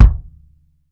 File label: BOOM; Sampleheads - New York City Drumworks VOL-1